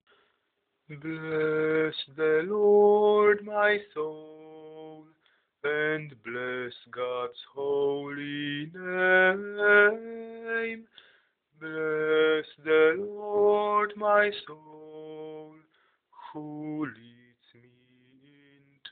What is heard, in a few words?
Bless the Lord bas